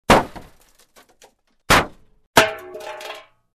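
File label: Hit on the car roof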